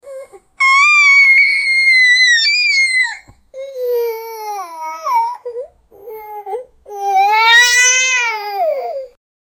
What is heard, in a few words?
child crying